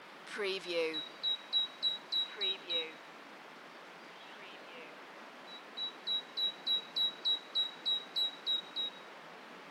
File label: Bird call in Forest; Moel Famau Forest; beanstalk audio